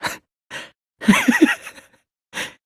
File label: laugh despair; dr2; hajime